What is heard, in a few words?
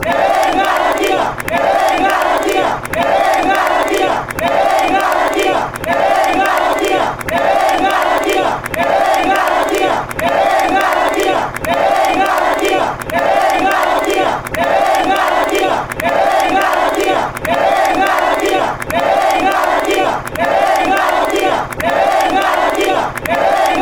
la liga chant